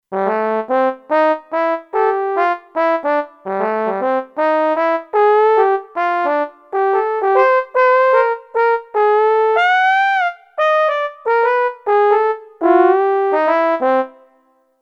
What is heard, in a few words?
Solo Trombone, Kurzweil K2500X; Factory Programs